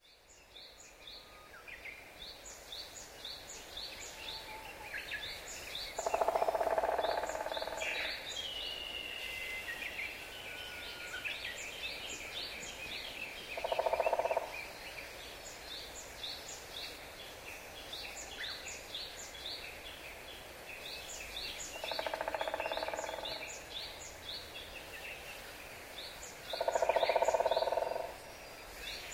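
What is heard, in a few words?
drumming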